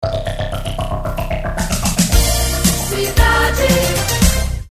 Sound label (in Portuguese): Vinheta; Cidade FM